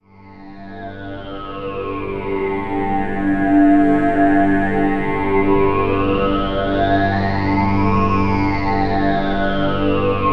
Drone; Drones; Sonic Foundry (Sony Creative Software); Slow Silhouette

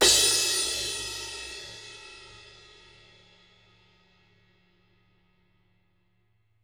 crash, Interactive Drum Kits

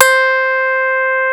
FUNK HARD C5, DIGITAL BASS; Music Store - Claudius Diemeter Schwerlos

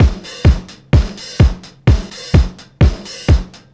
BEAT, Roots Disco; USB Soundscan vol.32; AKAI

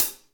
HATS L

